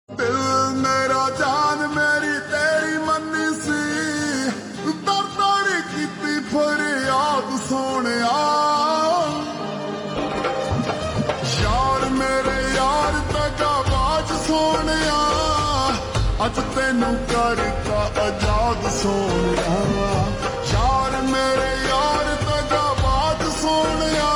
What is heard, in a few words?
Slow Reverb Version Ringtone